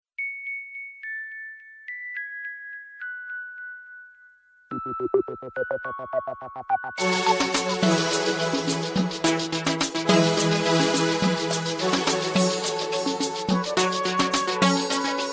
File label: instrument ringtone; krrish3